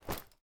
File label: fall far